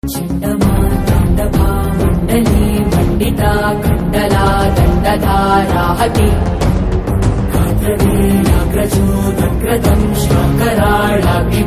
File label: telugu tone